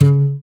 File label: GUI AC, Acoust. Slap; Sound & Vision; Gigapack I CD 2; GUI ACOUSTIC 2